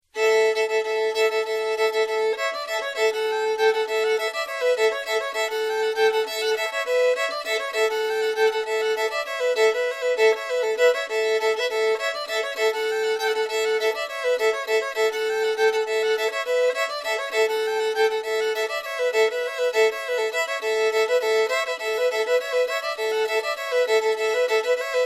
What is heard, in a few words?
fiddle